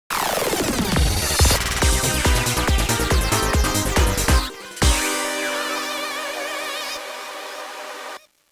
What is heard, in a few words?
game over; Dhinameptor